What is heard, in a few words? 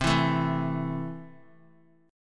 C 6th C down Guitar; C6 strummed